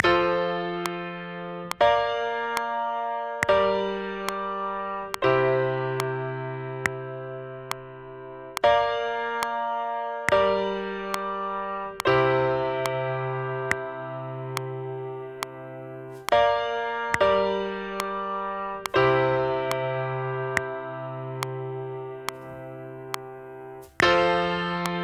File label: Non Resolutionary Chords